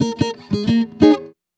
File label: ACID JAZZ; Best Service Advanced Media Trax Modern Composer